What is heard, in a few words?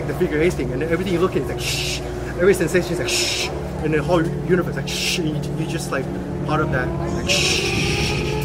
shhhz; yang